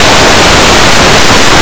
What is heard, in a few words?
cello